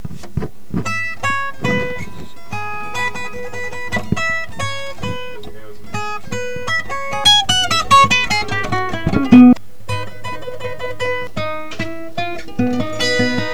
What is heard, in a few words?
Acoustic Guitar